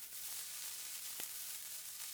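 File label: rifle; shooter flare burn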